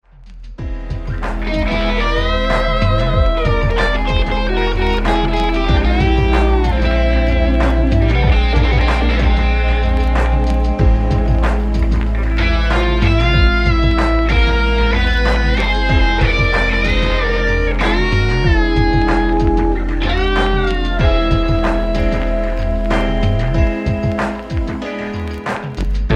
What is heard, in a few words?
gtr